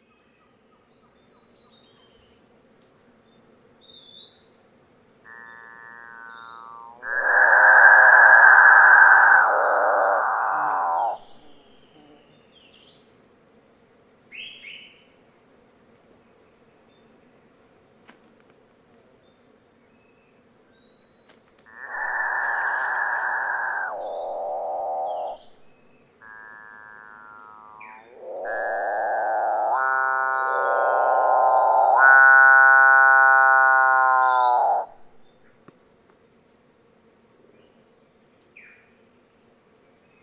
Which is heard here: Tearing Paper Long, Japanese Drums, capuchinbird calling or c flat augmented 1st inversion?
capuchinbird calling